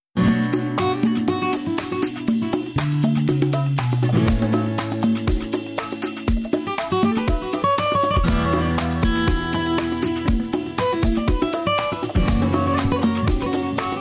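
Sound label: ACOUSTIC GUITAR VST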